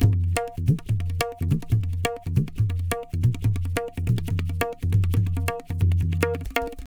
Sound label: Tabla, 140 BPM; Zero-G; Pure Tabla